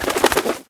wingflap; World Of Goo; Fish

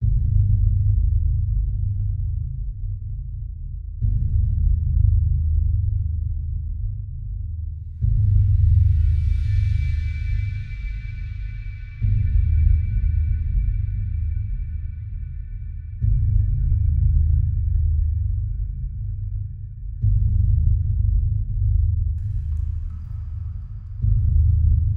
spooky ambience; halloween

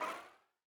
circuit upgrade

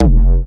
wave hit; CrossCode; battle

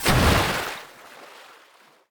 geyser; World Of Goo

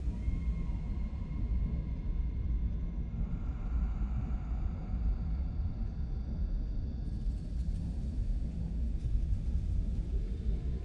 shock ambient; CrossCode

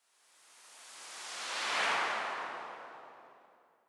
swoosh fall long

